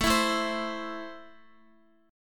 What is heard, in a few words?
A Minor, Guitar; Am strummed; x,x,9,9,9,8; 4F,4A,5C,5G,5C,6E